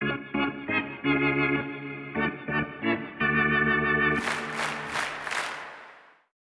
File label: organ